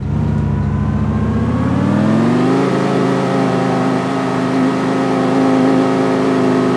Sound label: vehicle reverse; schafter